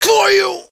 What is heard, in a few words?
death, Human